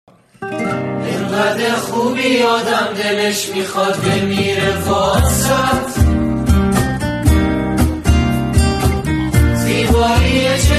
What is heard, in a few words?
Mass group performance with guitarr